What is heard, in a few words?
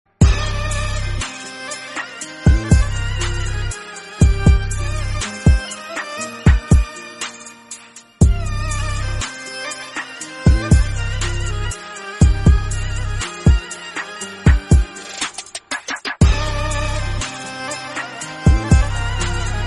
sad arabic violin beat